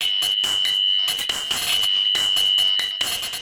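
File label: Saver Loop, beats; Sonic Foundry (Sony Creative Software); Esoterik Beatz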